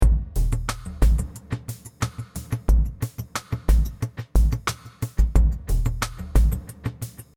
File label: Ambient 90